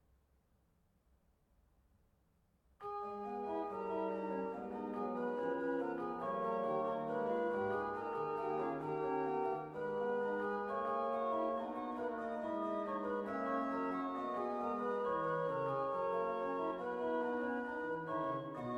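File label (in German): Sei gegruesset, Orgue; BWV 768; Freiberg; J.S. Bach – BWV 768 – Partita en sol mineur: Sei Gegrüsset, Jesu Gutig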